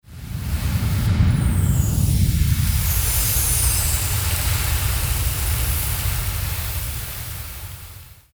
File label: Ancestor Waterfall; Barrens; Journey